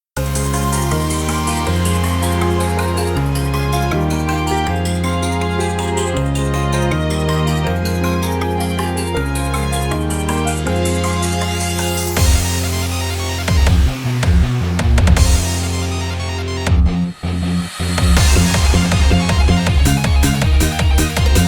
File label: Instrumental